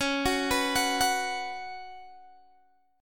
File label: Db 7th Suspended 4th Db down Guitar Open G